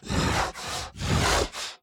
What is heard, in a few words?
Enraged Breathing Loop; The Town; Burns